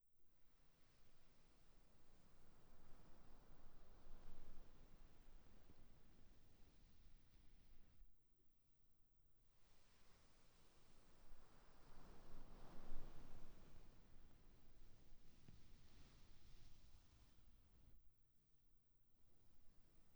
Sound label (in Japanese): ふわふわ タオル; ノア